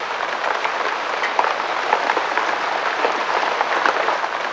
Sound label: RAIN; Zero-G Ambient 1; THUNDER+RAIN